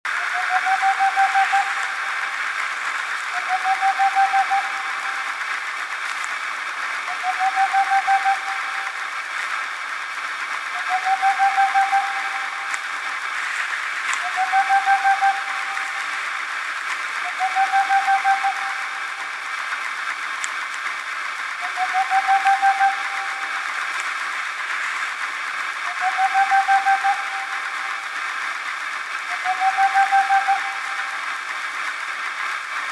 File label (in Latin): Aegolius funereus